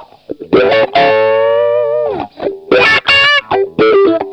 dirt, guitars; Loopasonic

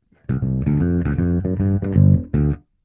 finger bass

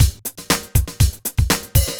flat 120, drums; FLAT FUNKY 120; Loopasonic

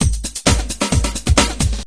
haze, drums

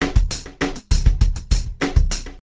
brush muff, drums

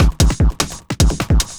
mental 150, drums; Loopasonic

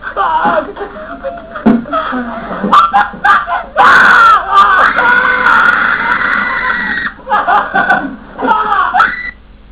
laugh; THE FITZY WITCH PROJECT

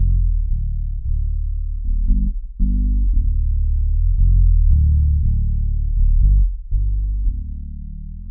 dub, bass

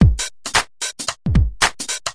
garage 115 break, drums

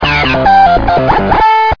squelch, bass

